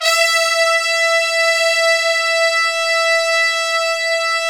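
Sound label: trumpets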